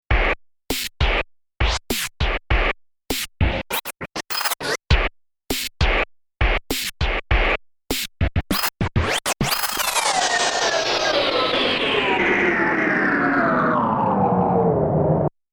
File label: Modwheel DJ; PC3